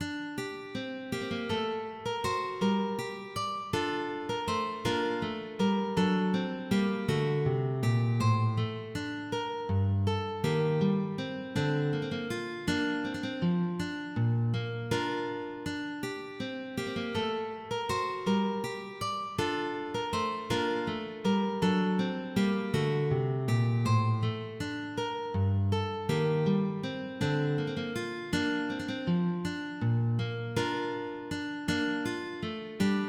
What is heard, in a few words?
Courante; Belleville